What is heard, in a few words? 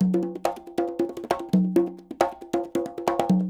SHUF, Percussionworks; Sampleheads; NYC Percussionworks